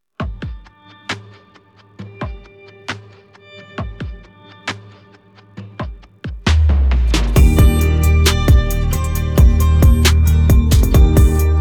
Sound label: instrumental, persian